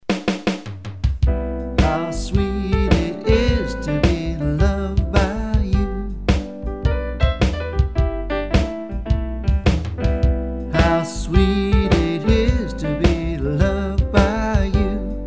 Swing

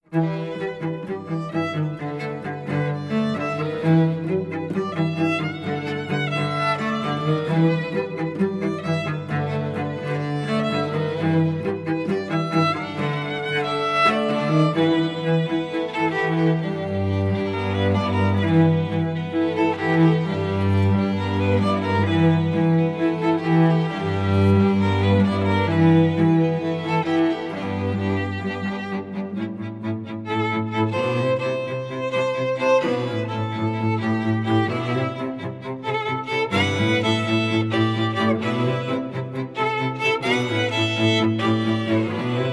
String Quartet

